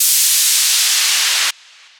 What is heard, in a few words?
Swish Downfall; Effects; Ableton; Factory Packs; Beat Tools